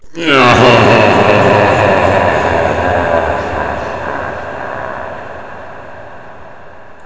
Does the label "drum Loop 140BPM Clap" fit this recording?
No